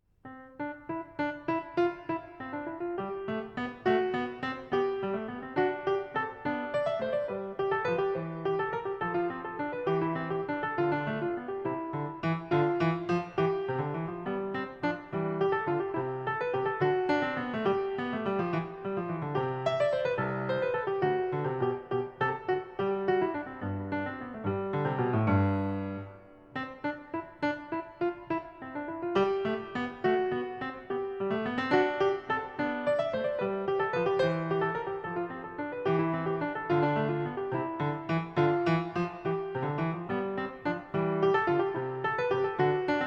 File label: fugue